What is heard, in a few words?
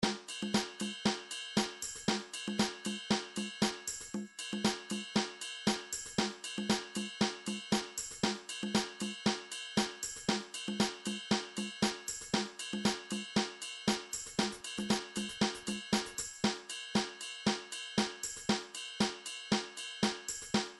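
midi drums world beat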